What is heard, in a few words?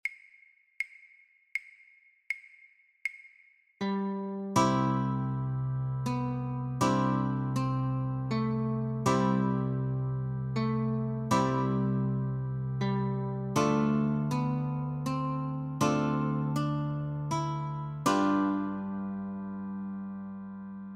It Came Upon a Midnight Clear - Notes & Ch, guitar; christmas